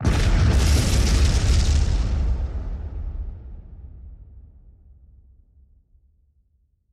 anime explosion

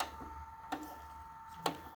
VHS start play stop

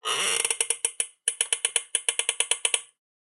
Chair Squeak

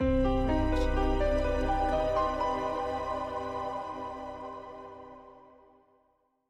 Mystery Ident; soundscrate

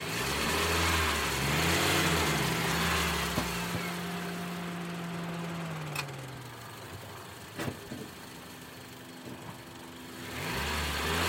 car engine rev

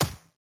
8-Bit Gun Shot; SoundsCrate

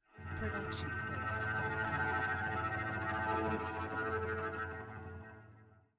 Cello Shivering Tremolo; SoundsCrate

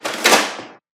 printer opening closing; soundscrate

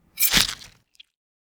bloody knife stab

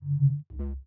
lightsword swing swipes; soundscrate

